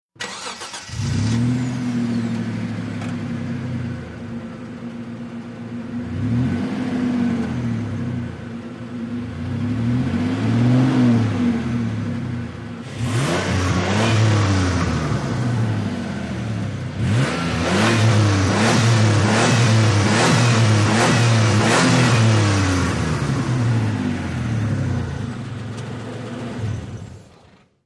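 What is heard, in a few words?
Montero Start Revs, 1998 mitsubishi montero ext.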